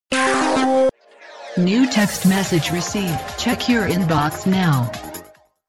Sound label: news bulletin sms